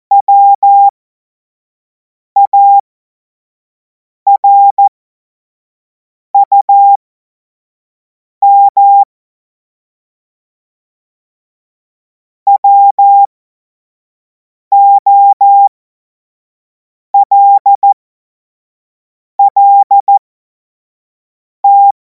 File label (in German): Witz, Morsekurs, cw morsen; hamradio; cw witze